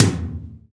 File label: TOM, KIT Dance Kit, Roland; 90 s Sample CDs; Sound & Vision - Gigapack I CD 1; Dance-Kit 23